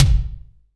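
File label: SDS V BD, bass drum; Best Service; Real Mega Drums VOL-1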